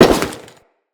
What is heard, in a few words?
Crewman Step Default, Footsteps; Enemies; Corpus